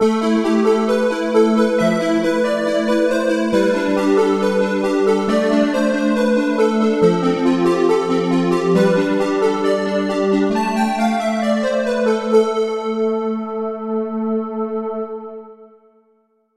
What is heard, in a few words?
Super Triangle; KORG OASYS; oasys assault